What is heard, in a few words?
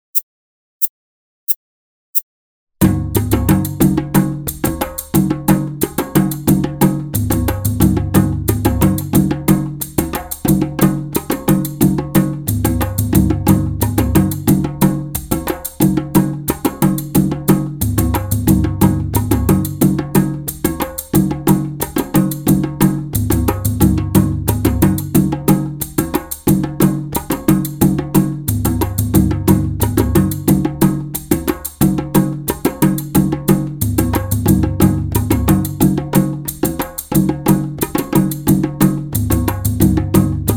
Donkori